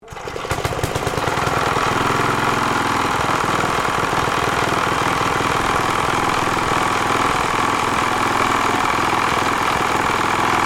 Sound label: Engine Villiers 412 operating, Classic industrial machinery; 1973; Motors; Machines